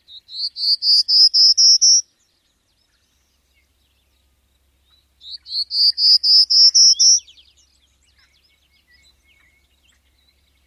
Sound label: songs; KY